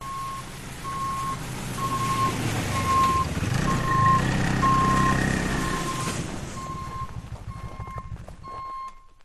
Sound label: Snowmobile Ski-doo approaches and stops beeping sirens engine; your free sounds